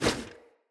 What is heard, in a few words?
Bow Melee Whoosh; Weapons; Grand Father Bow